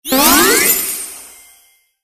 change wheel leaf; lucky charm

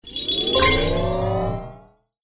collect unlock

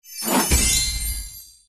coin collect

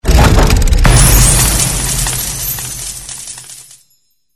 coin explode; moneybank

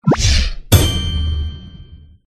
spinup icon

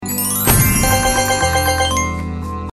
coins; pick bonus

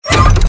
box rotate; moneybank

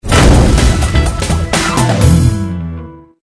dialog

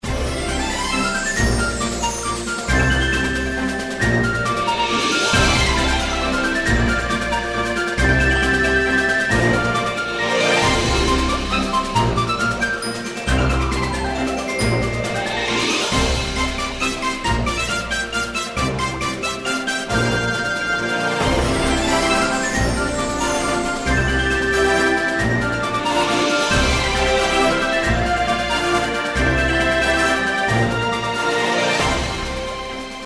bgm

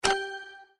click close

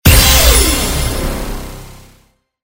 wheel stop; lucky charm

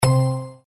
coin stop; coinSmart

